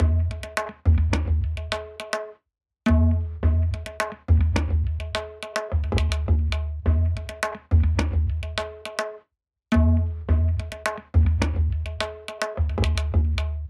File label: Tabla; raji an ancient epic